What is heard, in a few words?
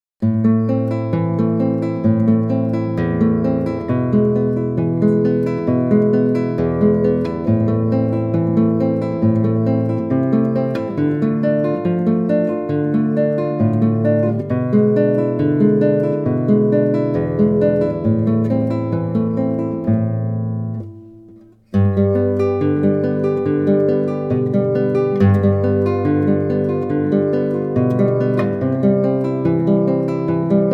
arpeggio, guitar